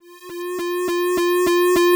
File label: loading